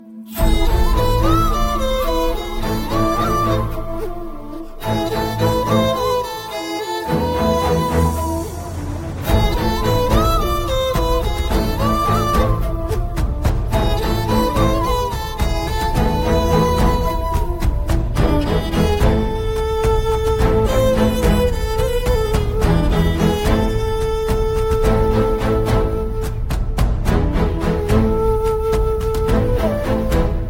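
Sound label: background music Ringtone; Sita Ramam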